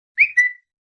vivo v20 notification sound

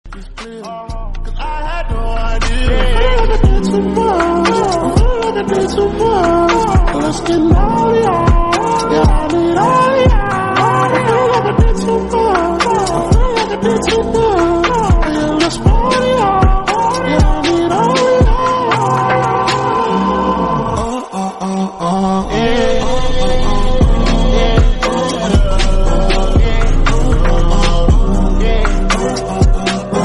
bgm bass boosted

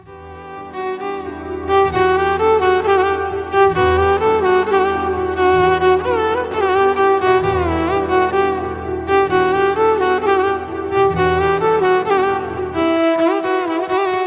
instrument music beautiful

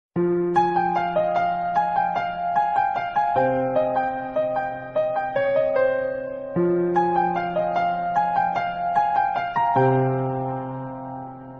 melody tamil

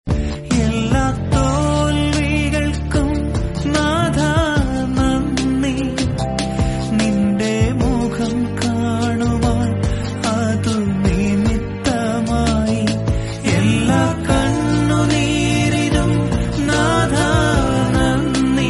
malayalam christian bgm devotional

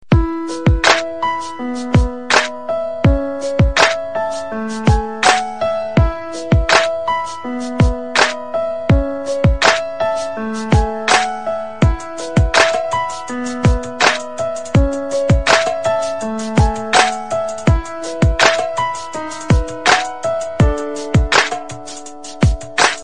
instrumental piano

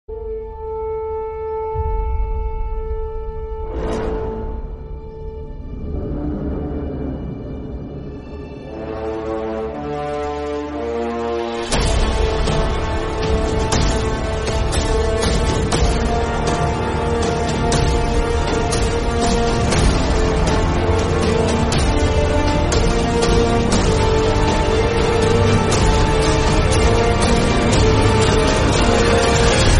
instrumental bgm piano; jurassic world dominion

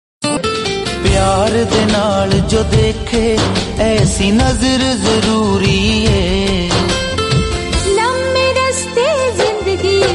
hindi sad; om shanti om

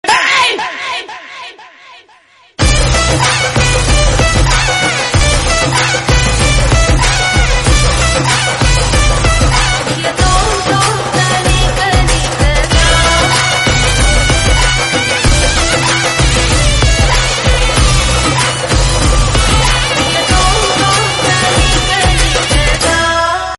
bgm

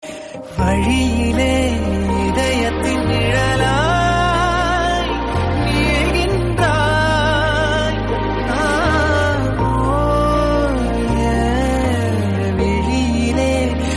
tamil song

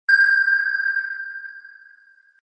submarine sound

